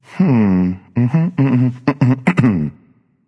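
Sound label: non verbal thinking, voice; cernunnos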